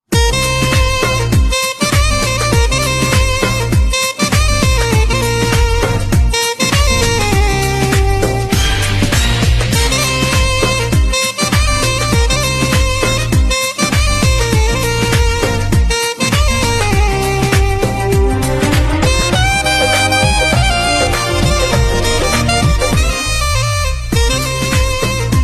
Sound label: Saxophone Instrumental Ringtone